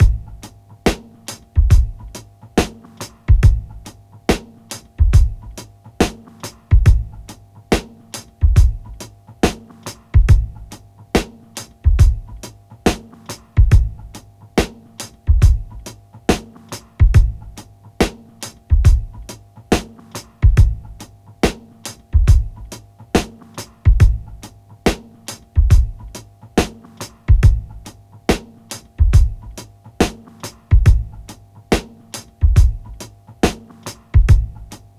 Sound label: 70 Slow Pumper, Breaks; Lockdown Breaks